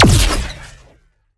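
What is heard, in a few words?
Sci weapon Shot Medium Laser Proton Popper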